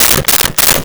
Staple Gun; Tools; 1001 Sound Effects

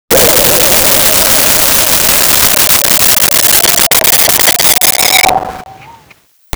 Saw Wobble, Vintage Cartoon; Hits & Tones; SONY PICTURES SOUND EFFECTS SERIES

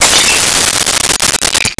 Window Break; Crashes

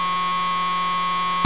Test of Emergency Broadcast System Loop; Test Tones

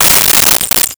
Lightbulb Break; Crashes

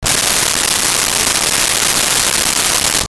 hairspray; Bathroom

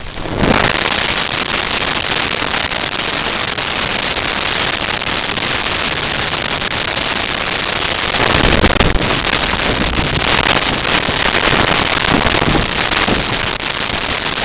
Landslide With Rocks; Natural Elements; SONY PICTURES SOUND EFFECTS SERIES

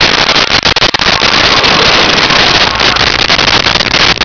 sfx pod binder start

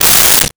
Aerosol Can, Bathroom; 1001 Sound Effects

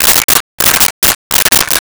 Stapler 3 Times; Office Supplies

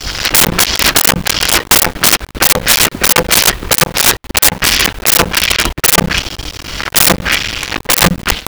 Plunger Rhythmic Metal; Vintage Cartoon; Foley

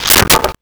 Plunger Ploink Metal; Vintage Cartoon; Foley

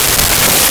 skratch

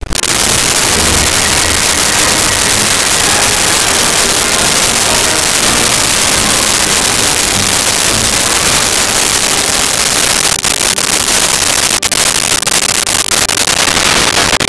awards, Music

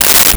Cable Tie; Tools; 1001 Sound Effects

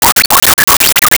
Little Droid, Science Fiction

